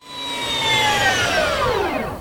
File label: move